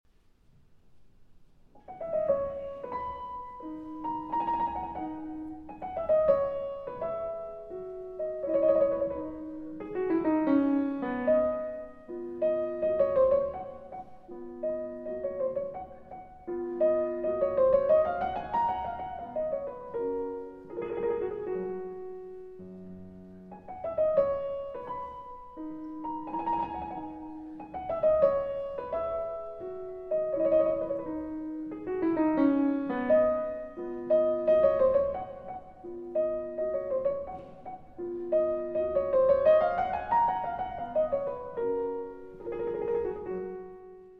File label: Keyboard Sonata in B Minor